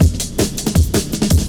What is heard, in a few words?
Drum Stepz Cut, Drum Loops 161.5; Zero-G Jungle Warfare 1, 2 & 3